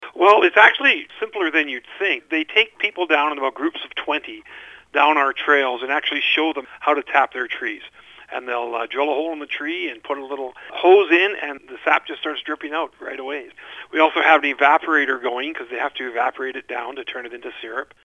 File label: tapping